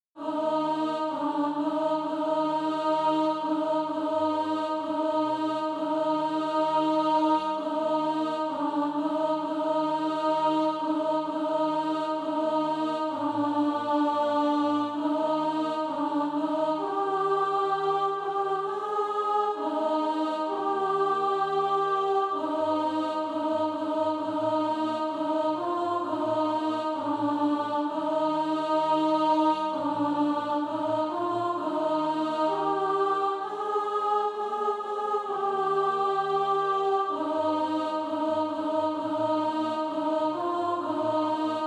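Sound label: alto, Practise Music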